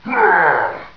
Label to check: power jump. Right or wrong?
Right